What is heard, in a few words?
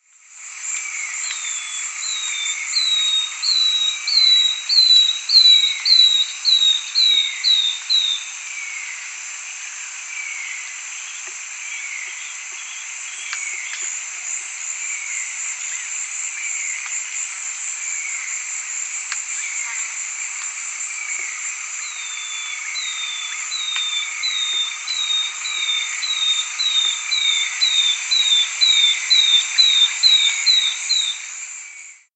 Rufous-Bellied Antwren, S. America